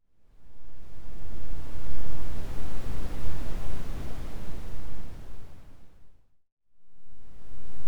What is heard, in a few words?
ocean, nature; Ocean Waves; 8s; Nature Sounds